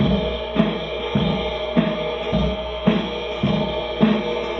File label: Loop, rhythmate, classic machines